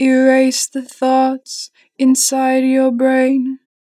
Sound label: Erase the thoughts, 2 bar vib, Vocals, Natural Vibrato; Harmor Vocals